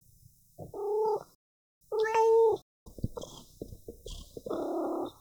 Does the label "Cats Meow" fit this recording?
Yes